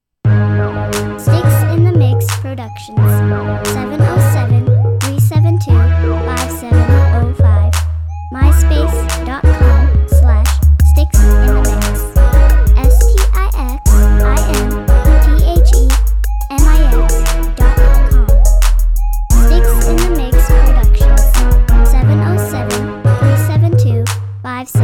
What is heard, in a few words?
crunk new